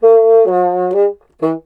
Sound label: bassoon